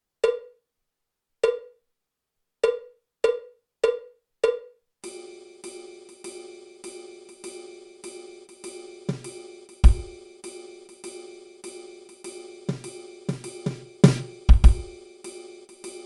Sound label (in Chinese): 架子鼓独奏 爵士风格; 66条架子鼓独奏SOLO